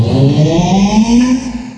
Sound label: klaxon